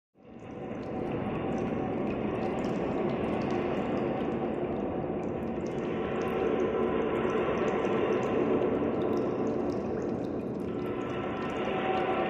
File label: Ghost Ship Ambiance